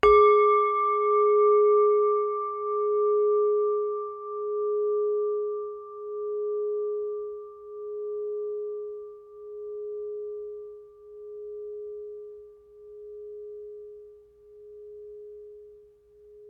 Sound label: singing bowl